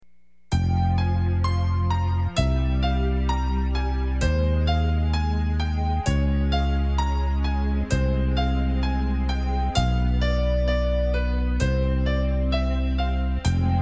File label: slow surf; yamaha